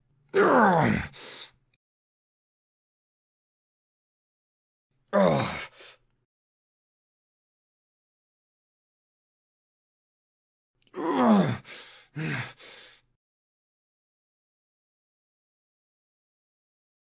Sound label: Writhing; Pitch Black - A Detective's Demise